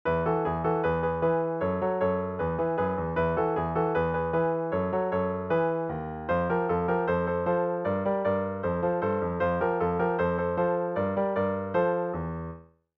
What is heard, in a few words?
victory